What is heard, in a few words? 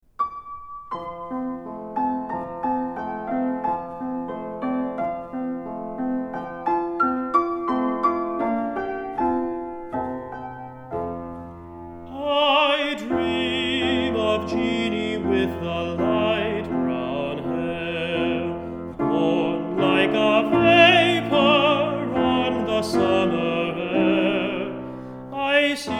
parlor song; Stephen Foster; Jeanie with the Light Brown Hair